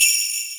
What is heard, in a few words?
suzu